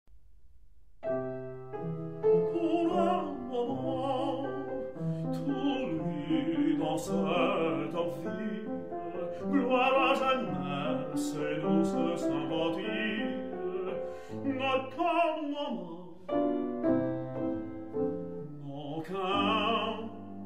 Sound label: For Voice And Piano; Glinka; S.Golitsïn